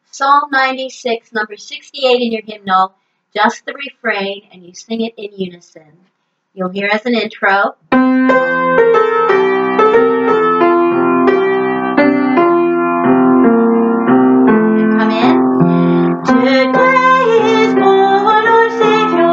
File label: all, choir; 68psalm96